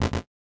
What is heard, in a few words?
Denied, retro rpg menu sounds; leohpaz